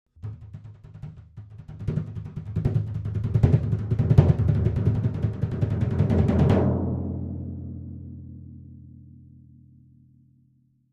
Timpani, (Hands), Thin (Pipe) Timpani, Crescendo, Muted, With Accents